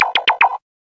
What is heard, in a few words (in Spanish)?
Disparos laser; tonos para mensajes; Notificaciones